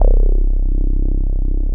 BASS L, Drum & Bass 170 BPM; Ueberschall; Drum & Bass Flash Trackz

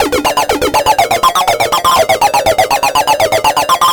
sync digest D 122, synth loop; Synth Loops; Zero-G; Soundclash Nu Dance Electro; Acidized; 122bpm

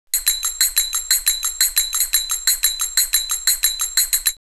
FingerLickin’ 120, drumloops World Ethnic; X-Static Goldmine

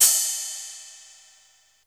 CYMBAL; Crypton - DrumBase5000

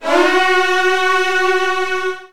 F#2, Virtuoso Strings; ILIO; Kirk Hunter Virtuoso Strings VOL-2

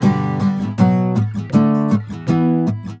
EM GTR, Celtic Flavours; USB Soundscan vol.42; AKAI

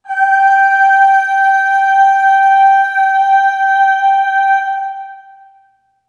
Aah Long G4 Short Reverb; Harmonic Building Blocks

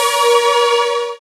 SYN DANCE; Sound & Vision - Gigapack II

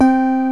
VIBES BELLS

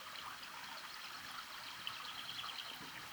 Waterway B Light

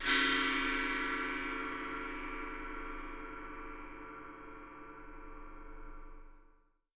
GONG SCRAT; Miroslav Vitous - Percussion & Harp

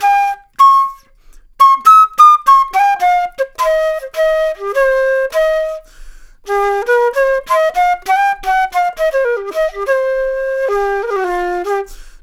Flute Solo, Jazz

